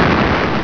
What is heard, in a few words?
FIRE